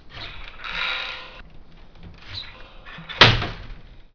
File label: screen door